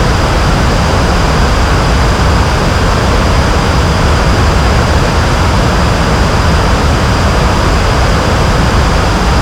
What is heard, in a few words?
Aircrafts Engines Engine Jet; DCS